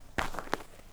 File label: FOOTSTEP Trainers Snow Compact Walk Slow; Phasmophobia